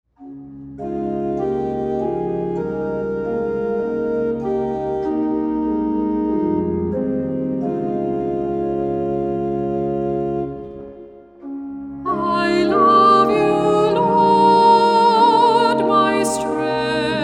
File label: Tone